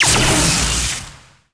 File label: laser Welder fire; weapons